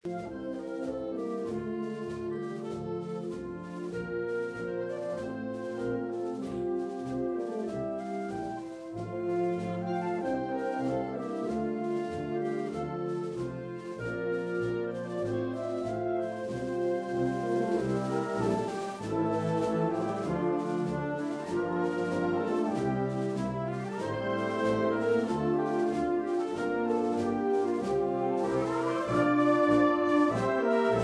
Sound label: Nordic Fanfare